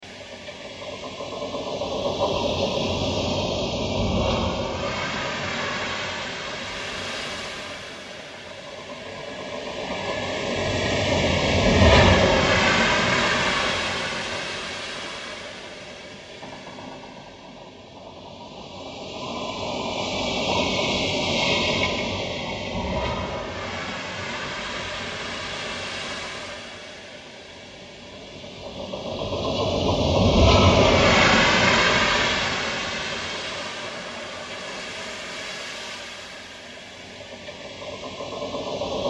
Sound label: Sci Fi Power Transformer Force Field Flange Whooshes Loop